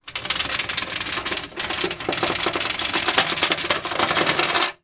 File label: industrial chain med pull over metal bar or edge